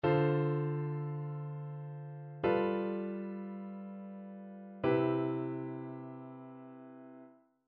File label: IIm7 V9 Ima7